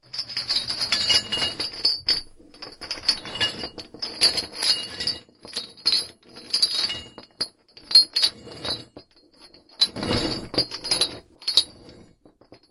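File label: sound of chains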